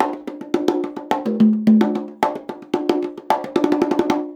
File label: 110 CONGA, Percussion Loops; USB Soundscan vol.36; AKAI